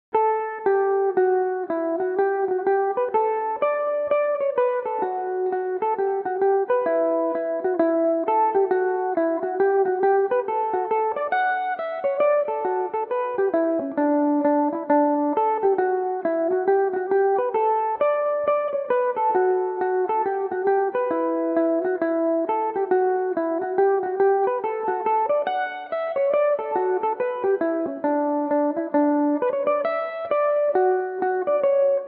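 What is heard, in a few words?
barndance D major, irish mandolin, tunes; sean hayes barndance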